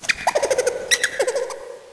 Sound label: rat idle